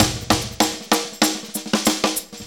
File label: INOUD16L, Fills; AMG; Now CD-ROM (Roland)